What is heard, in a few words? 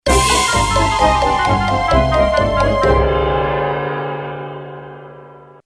game over